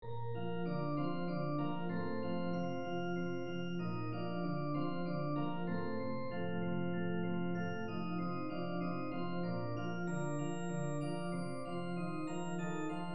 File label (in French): danse allemande sib majeur; haydn joseph